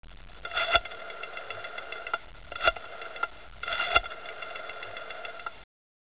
rotary phone

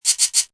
new quiz

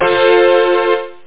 chord; OctaMED SoundStudio V1; jazzies samples

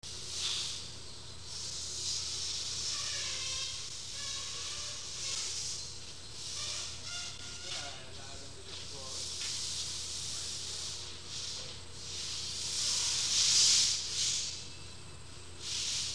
air hose

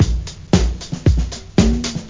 breakbeat; World of Sound; instruments; beats

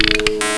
Buttons; McPoet 3.0

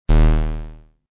Cant Afford Sound; mansion

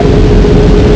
Retro; Kongbots 1.0; Robots; Strategy

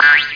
jump

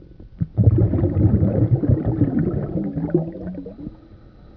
underwater; Heretic II Demo

